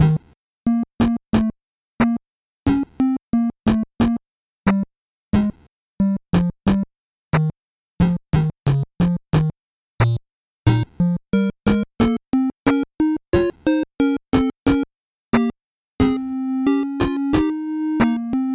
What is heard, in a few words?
8bit bgm slow